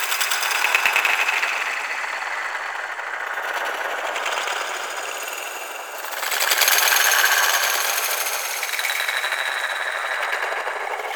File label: Moon Glowing Tree Loop Small; Orokin Moon; Warframe